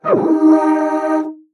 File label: Good Job; jibo-cli; behavior-template